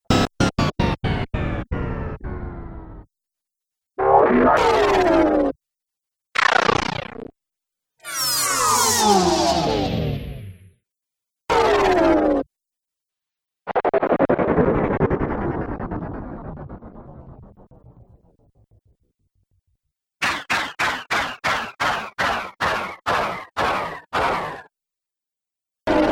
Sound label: 10 Sounds - Power Downs; Science Fiction Sound Effects